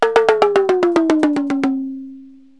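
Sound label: descending drum, cartoon; Wired for Sound Pro CD; Aristosoft, Inc.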